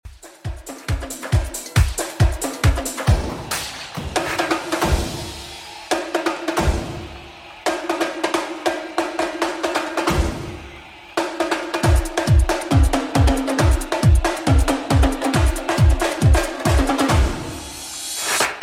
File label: 90s Redrum 137bpm